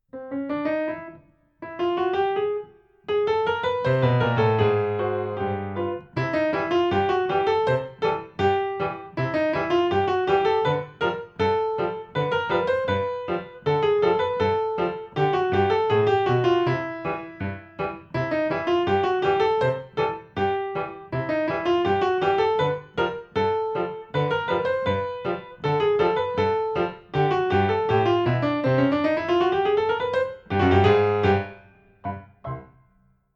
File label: Piano